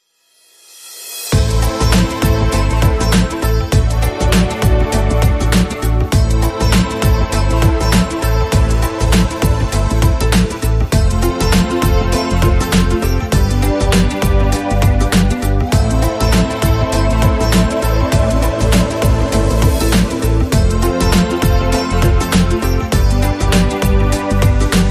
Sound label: Tibetan Winds